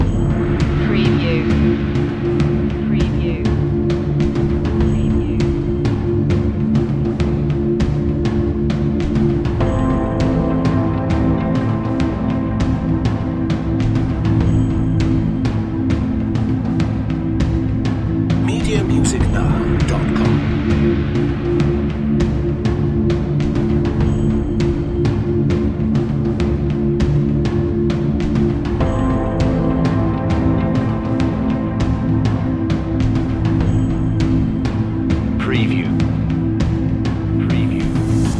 ambient army loop

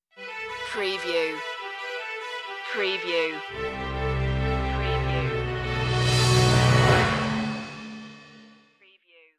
sting no choir

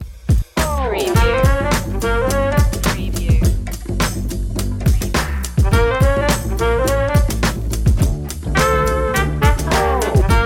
comical detective

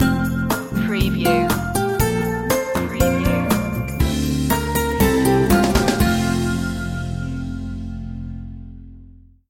a cheerful morning stinger

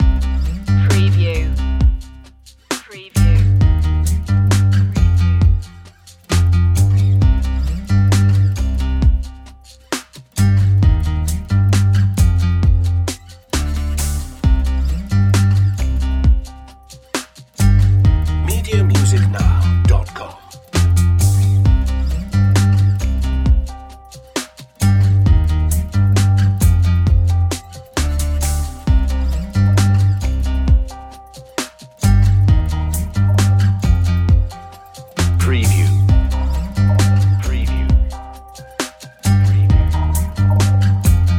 electro funk

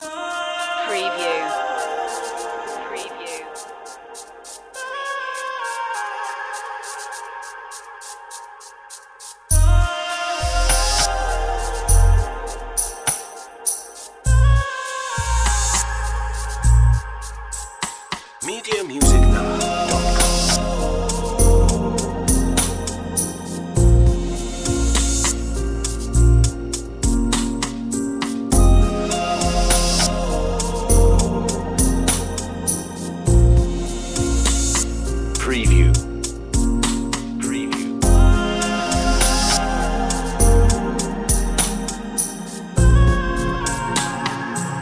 singing spirit 101bpm

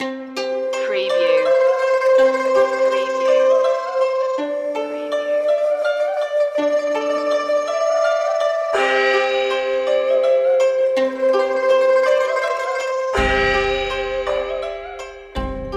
chinese waltz

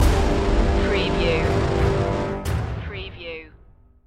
pirate attack stinger

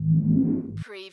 Space Scifi Sound - the generator

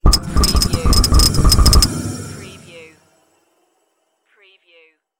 space pinball ricochet

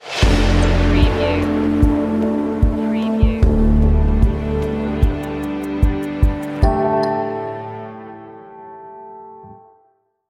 pieces falling into place stinger

